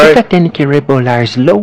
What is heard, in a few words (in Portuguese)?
rebola slow